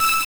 saw; big tower tiny square

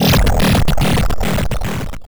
lava flow; big tower tiny square

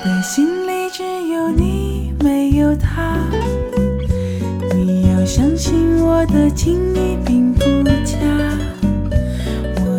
jazz

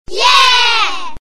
auto crash; jelly truck

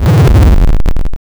door open; big tower tiny square

